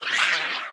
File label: Death; Folk Hero